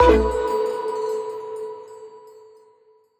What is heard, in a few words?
Menu FX Rolldown - A6(Sus2); Video Game; Cymatics; Video Game Sample Pack Vol 1